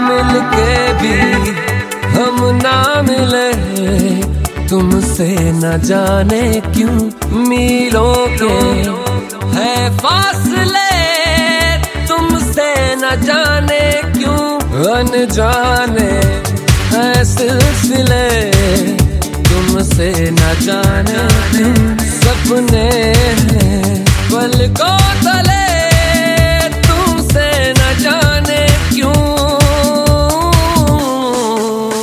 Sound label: Hindi Ringtone